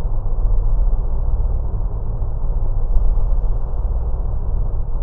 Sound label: Duck Monster; MiSide